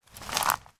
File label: foley footstep twigs forest floor; zapsplat